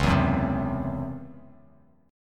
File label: Db Minor Major 7th Double Flat 5th Db down Bass Standard; DbmM7bb5 strummed